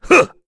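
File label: Vox Attack, Korean; Bernheim; kingsraid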